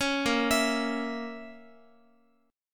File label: Bb Diminished Bb Guitar; arpeggiated; JGuitar